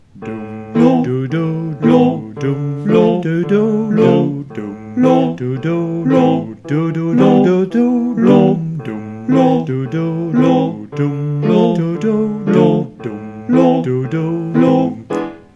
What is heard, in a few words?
Refrain Tutti